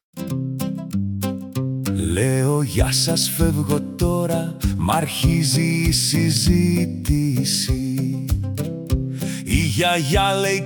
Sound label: campfire acoustic; Greek; key into greek; book 1; leaving now